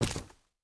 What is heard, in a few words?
Smol Man Walk